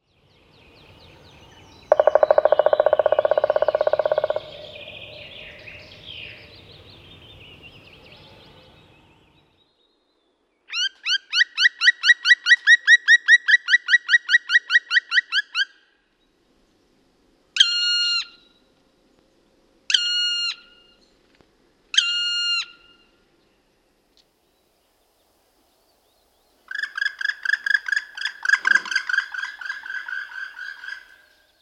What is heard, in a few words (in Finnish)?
palokarki; tunnista suomen tikat